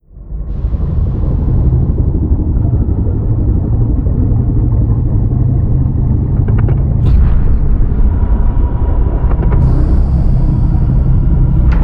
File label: fungoid colossus opening; apocalypse